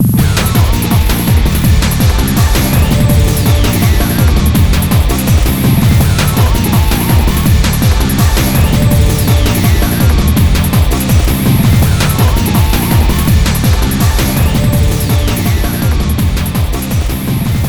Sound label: CONTINUE; the king of fighters maximum impact regulation a; ps2; KOFMIRA